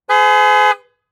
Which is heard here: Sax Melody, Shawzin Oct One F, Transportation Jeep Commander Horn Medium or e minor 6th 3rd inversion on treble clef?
Transportation Jeep Commander Horn Medium